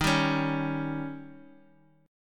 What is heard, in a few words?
D sharp 7th D sharp; strummed; JGuitar